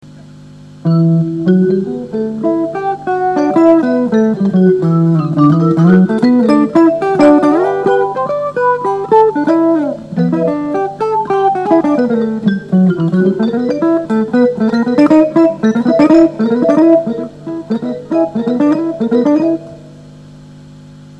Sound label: marimba jazzy